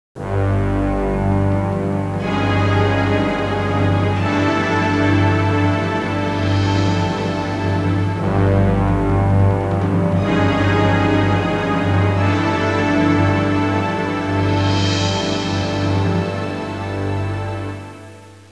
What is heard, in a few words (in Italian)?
musica sinfonica; symphonic